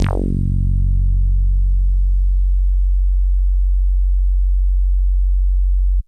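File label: Synthe Bass DX100 F#2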